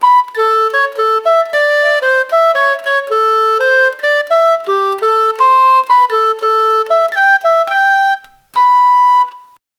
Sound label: japanese traditional folk